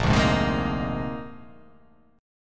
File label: A sharp 11th